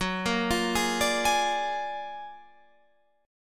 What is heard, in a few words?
F sharp Augmented Major 9th F sharp Guitar Standard; arpeggiated; JGuitar